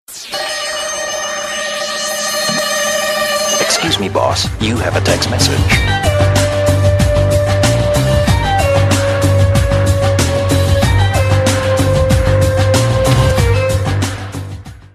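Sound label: Text Message Ringtone; Mission Impossible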